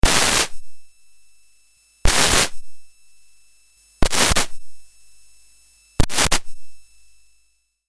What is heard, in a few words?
Metasonix D 2000 Link Pitched Drum Output